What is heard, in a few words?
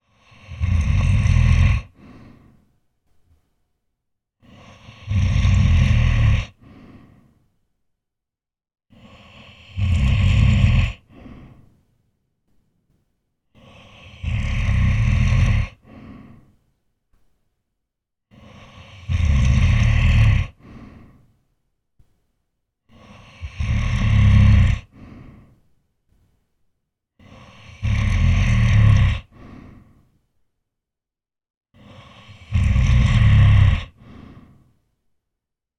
fantasy giant snoring sleeping